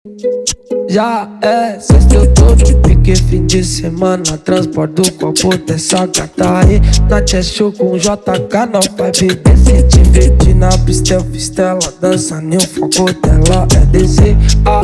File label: TRAP NACIONAL, rap trap nacional